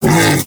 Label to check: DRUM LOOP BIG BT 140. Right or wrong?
Wrong